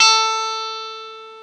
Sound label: Gretsch A4